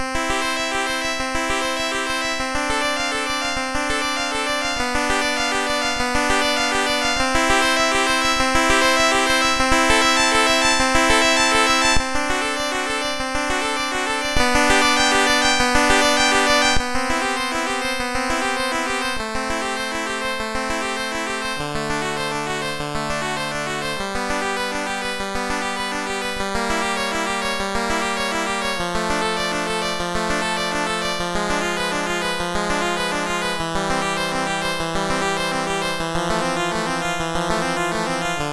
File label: Prelude No1, MOS6581R4